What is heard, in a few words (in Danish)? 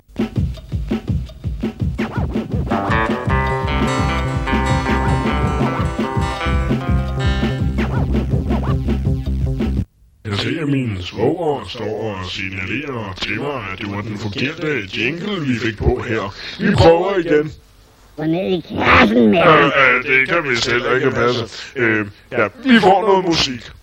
forkert jingle